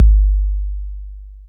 K 808, KICKS TR 9+8

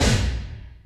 Door Slam, Drum Machines Alesis D4

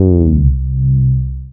Single Electric Basses, Dance Floor Bass

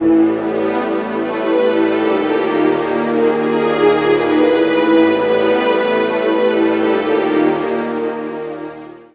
BAC, MUSICMOTIVES; Best Service ProSamples vol.49 - FX-Area; AKAI

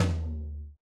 RX8 Tom, Yamaha RX-8

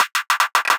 Beatbox Fills 150, Hybrid Beatbox Drums; Antidote Advent

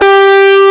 EG OD G3; AKAI S-Series CD-ROM Sound Library VOL-2

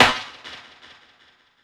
SD, Drum & Bass 160 BPM; Ueberschall; Drum & Bass Flash Trackz

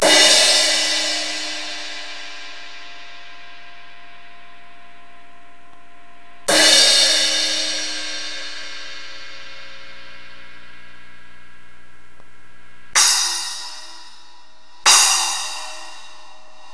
Roland S-550 Crashes

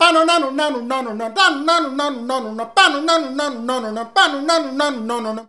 PANONANO, MALES, Hyper Dance; Best Service

